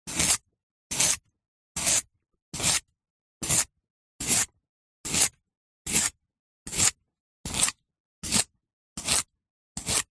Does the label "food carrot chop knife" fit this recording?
Yes